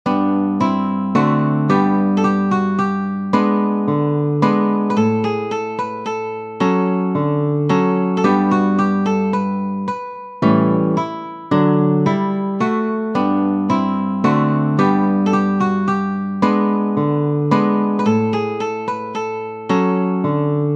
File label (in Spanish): Minueto en Sol; Arcas; julian arcas